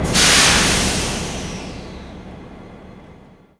Train Engine Steam Blast; Sony Pictures Sound Effects Series; Vehicles; Trains

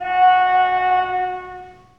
Car Horn; Sony Pictures Sound Effects Series; Period Vehicles

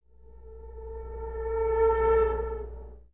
Saw Tone; Sound Design - Horror; Sony Pictures Sound Effects Series Volumes 1-10; Sony Creative Software

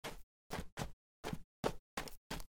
Running Steps; Age of Empires III; cinematics

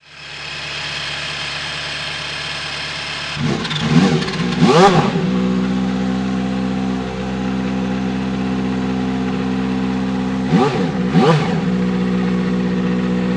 Race Car Idle Rev Away; Sony Pictures Sound Effects Series

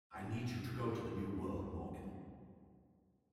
Reverb; Microsoft Studios; Age of Empires III - Complete Collection; cinematics

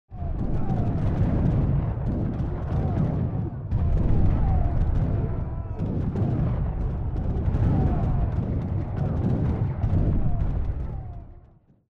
Battle; Microsoft Studios; Age of Empires III - Complete Collection; cinematics

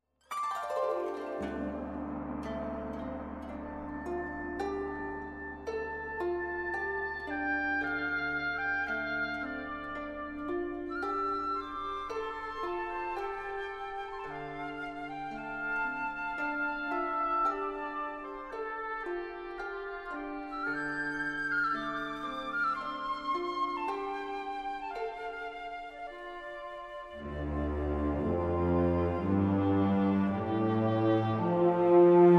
Music; Age of Empires III; cinematics

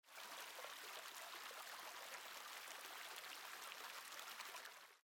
Creek; Age of Empires III; cinematics

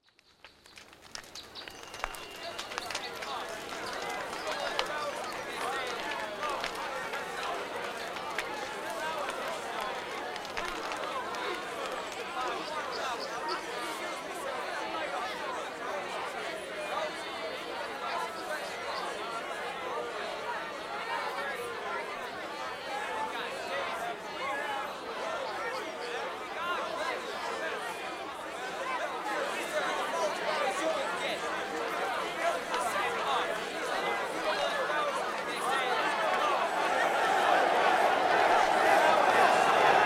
Ambient; Age of Empires III; cinematics

